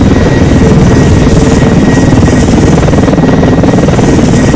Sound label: hind, vehicles; quake2